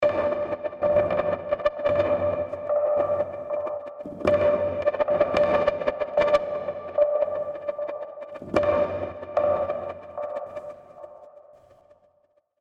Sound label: Felt Pluck WET